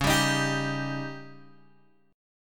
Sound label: Db Suspended 2nd Flat 5th Db down Guitar Open G